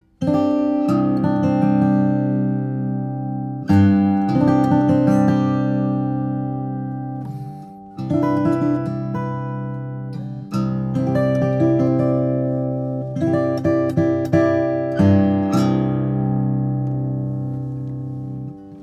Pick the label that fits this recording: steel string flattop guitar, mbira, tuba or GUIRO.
steel string flattop guitar